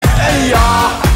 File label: eyaaa